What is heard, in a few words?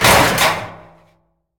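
Metal Hollow Heavy; Prison Architect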